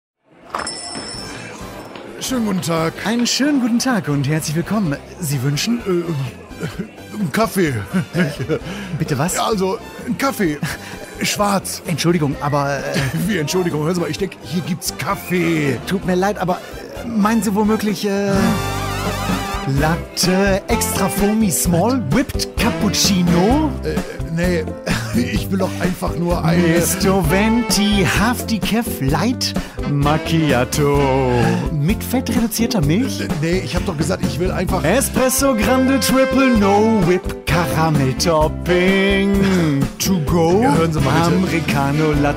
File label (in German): Kaffee Song